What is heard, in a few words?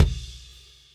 Kick In